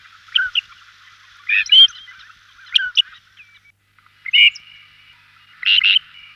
grieche, Musique

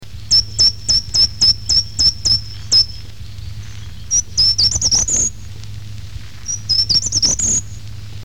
grimpereau

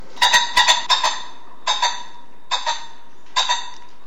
faisan

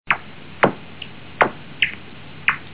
ch souris

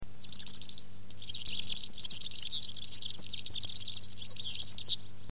serin

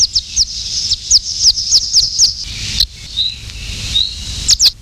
hirondelle

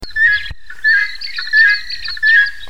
pintade, Musique

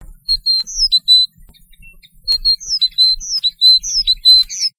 charbonnière

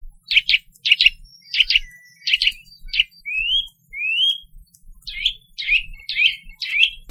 sittelle, Musique

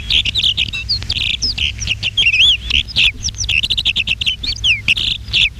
accenteur

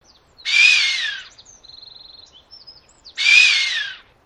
chamois

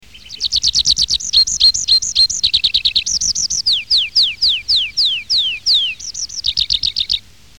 pipit arbres

